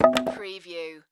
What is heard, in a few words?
Wood Drop 2x4; Sound Effects Bible